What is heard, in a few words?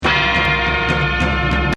Aggro Agg stab gitaar drums; Outdoors Old